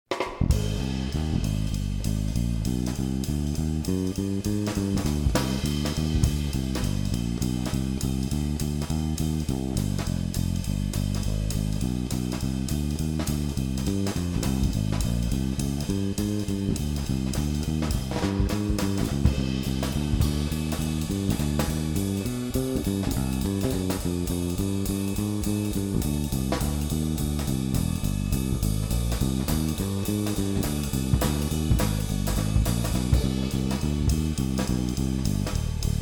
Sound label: C Minor Blues; for Guitar & Piano Auditions; jazz audition